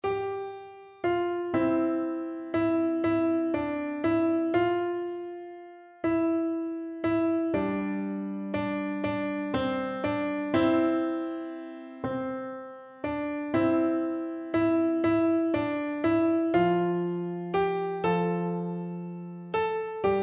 piano

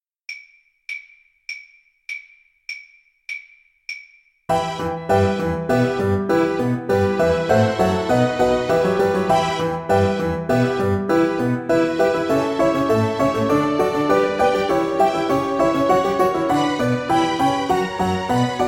keyboard; bach badinerie

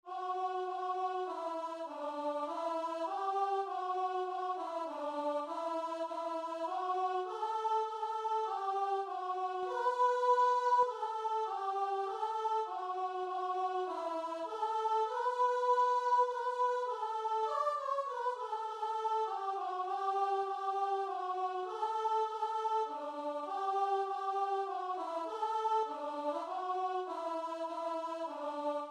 hereford, keyboard

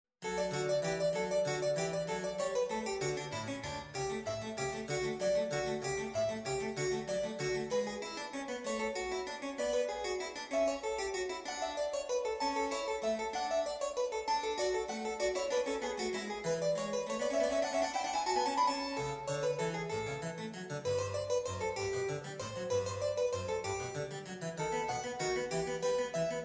clavecin fantasies, harpsichord; twv 33

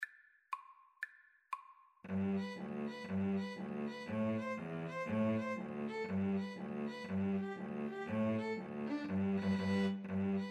irish jig medley, 2 Violins Cello

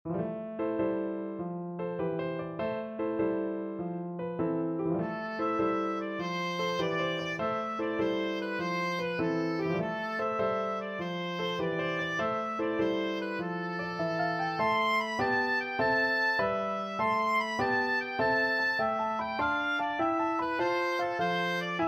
masters in hall, oboe

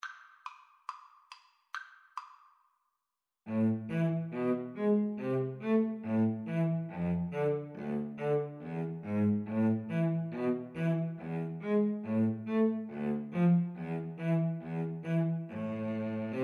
lully gavotte, Viola Cello Duet

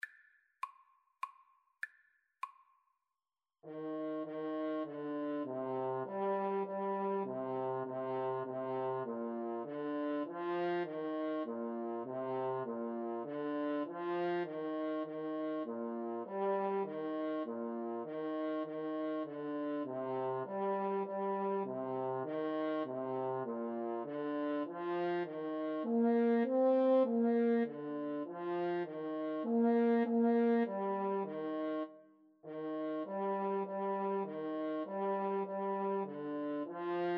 star spangled banner, French Horn Duet

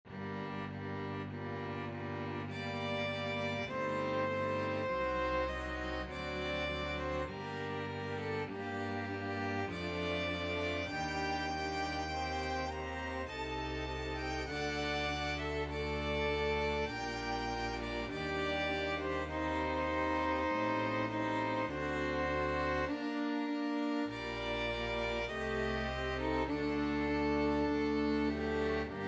sonata, string ensemble; eccles

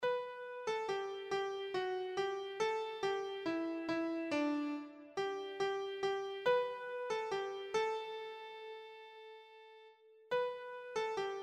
softly and tenderly, keyboard

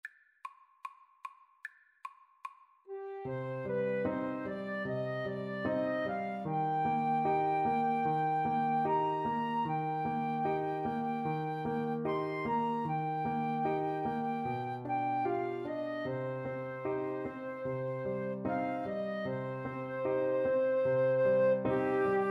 Flute Clarinet Duet

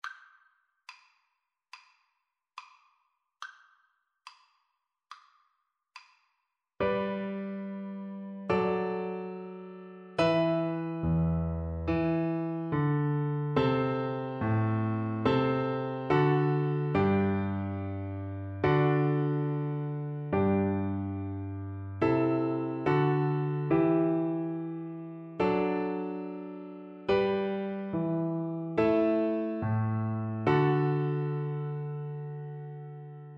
March, Flute; Handel

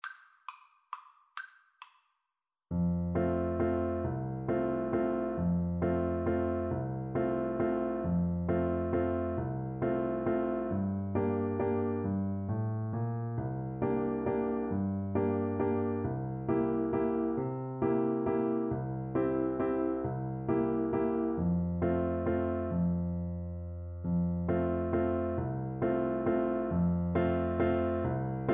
gran vals FL kar, Flute

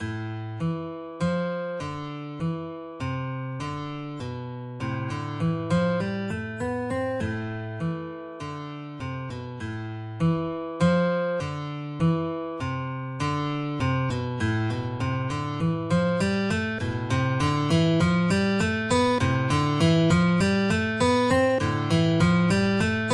guitar duet

